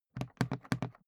briefcase plastic handle handling quick; industrie wirtschaft; bueroarbeit

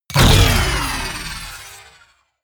sci-fi menu close heavy; hits impacts

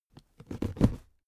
cardboard box small put down; objekte

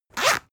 zipper open harsh; objekte; reissverschluss

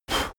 blow; soundpool

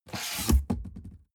cardboard box open unfold bounce scrape; objekte

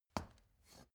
step ladder wood single; schritte

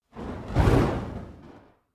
fire torch whoosh long; swooshes-swishes

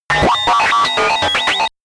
calculator high, comedy cartoon robot; soundpool

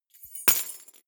key ring large drop parquet hard; objekte; schluessel

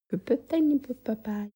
woman sing ethnic

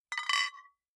flip-top bottles clink multiple; gastronomie; berufe

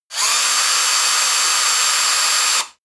cordless drill high rpm; maschinen; bohrer; soundpool

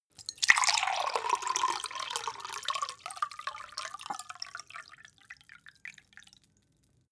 glass pour ring; berufe; gastronomie; soundpool

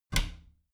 bathroom cabinet both close soft; objekte; tueren

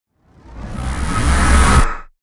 whoosh stutter; swooshes-swishes

